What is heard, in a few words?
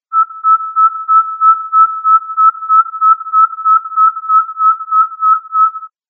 Central American Pygmy, owl